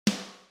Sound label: snare processed; Snare Drum: Processed